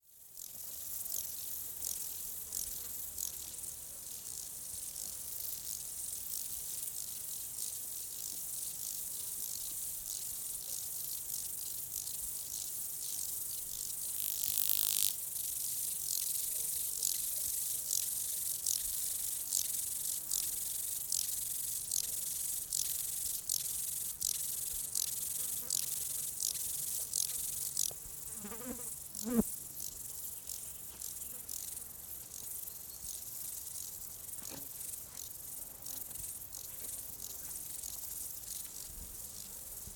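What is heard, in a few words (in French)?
Insecte, Paysage sonore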